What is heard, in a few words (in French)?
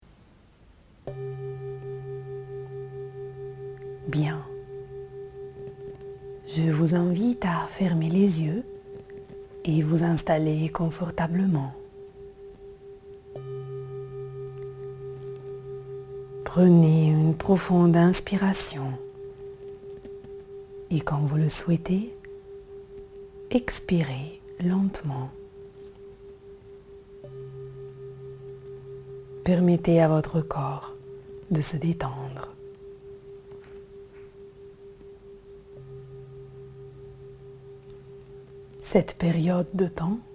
bande sonore